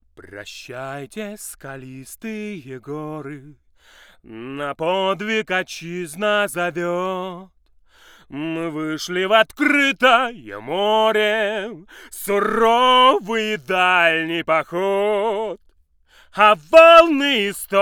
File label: voc, music